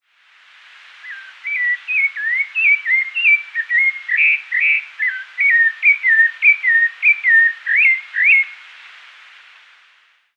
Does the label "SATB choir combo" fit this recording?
No